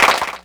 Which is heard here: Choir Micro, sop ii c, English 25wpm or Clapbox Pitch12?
Clapbox Pitch12